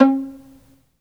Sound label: SOLO VI, Synclavier Strings; ILIO